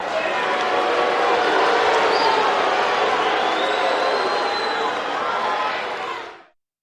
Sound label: Crowd Boos Short Burst